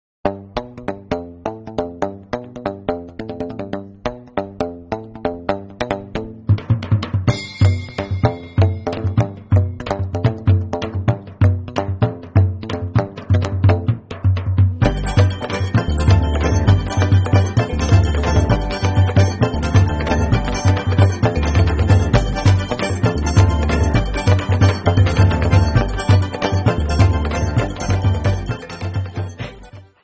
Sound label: donkey beat